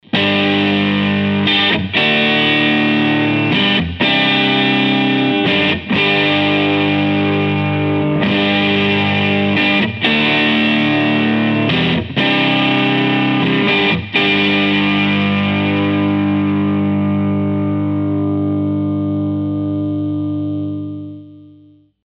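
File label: prs neck clean rhythm; prs22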